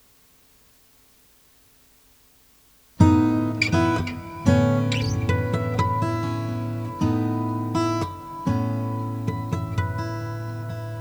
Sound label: TC-D5 LINE DOLBY OFF